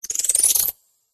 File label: squeaky metal Designed UI; PMSFX